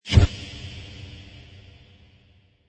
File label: Sceneflash, Sci-fi or drama, reverberant flash or backdraft sound.; In/out of dream or memory sequence, etc.